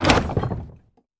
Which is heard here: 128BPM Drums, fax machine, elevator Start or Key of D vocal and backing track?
elevator Start